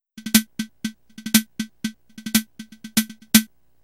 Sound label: tank drum